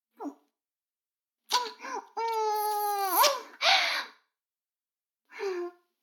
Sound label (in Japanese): フェラ音声; 逆時間停止されて